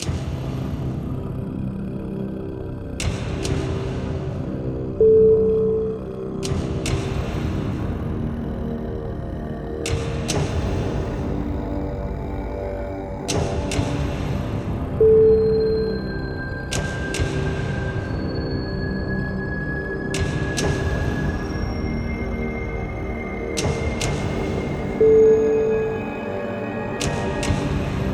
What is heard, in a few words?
monster horror buildup